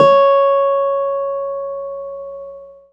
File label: BD C#4; Keyboards; Ableton Foundation FreePacks; Model-C